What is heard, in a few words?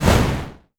creature; wagic; mtg